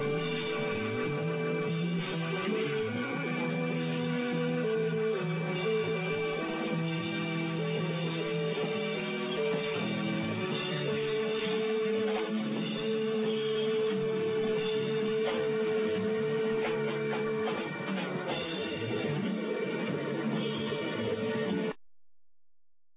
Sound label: Gong